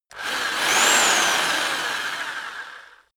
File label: rock drill rev reverse double; maschinen; bohrer